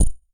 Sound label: Drum